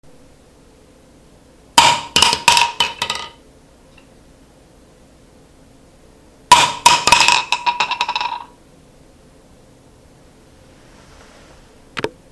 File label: Shotgun Shells Drop